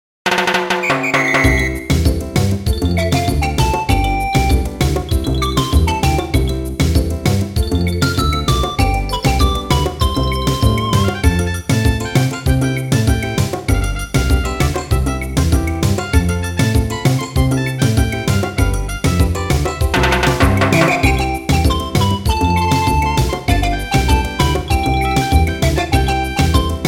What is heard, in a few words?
Island Midi